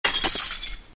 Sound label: glass break; iBreakd